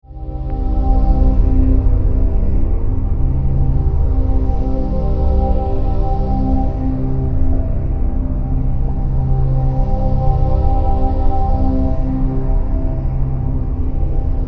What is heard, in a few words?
Synth, Stab, Drone, Horror, 5.1